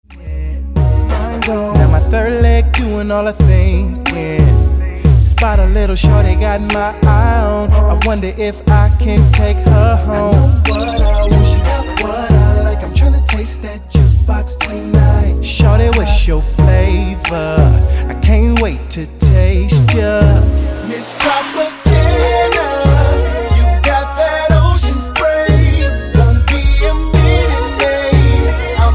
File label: Extended Redrum